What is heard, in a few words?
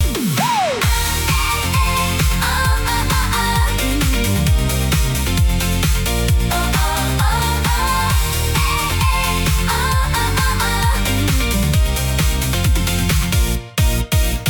loop